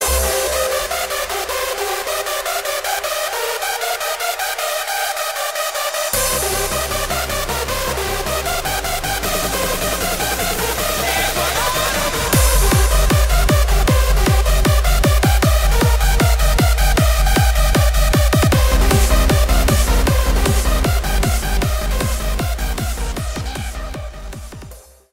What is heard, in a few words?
Hardstyle